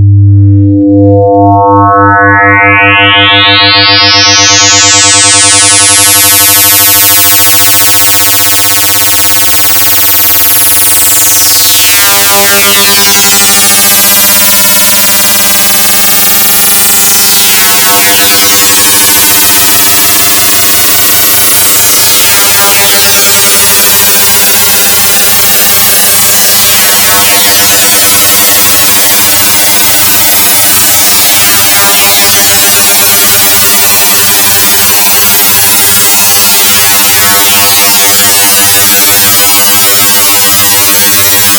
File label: sine